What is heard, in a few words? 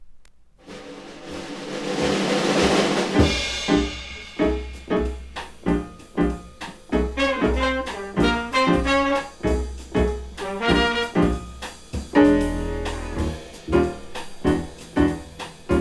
WASHINGTON JAZZ ENSEMBLE